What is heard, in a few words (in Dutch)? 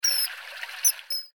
ijsvogel